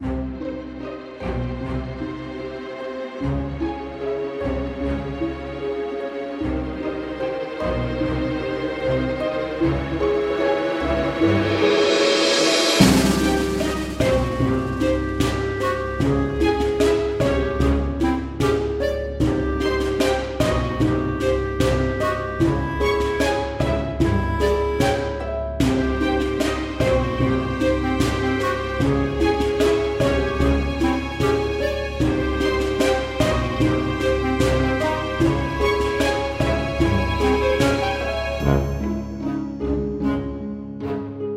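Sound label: Halloween music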